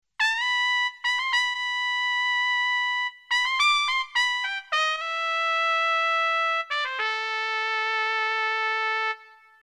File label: reverb